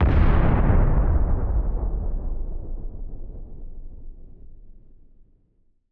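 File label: explosion extra norm deep; weapons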